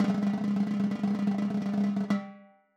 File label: timbales High Roll; Raw Perc One Shots; Slate; SD SEISMIC Drum Sample Pack